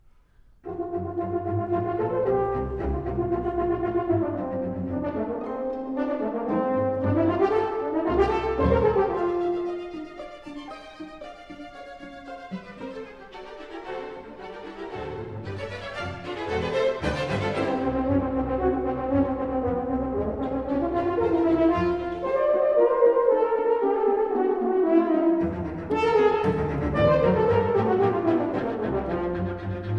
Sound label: Concerto For Horn and Strings - Mvt. 3. Allegro con spirito, quasi presto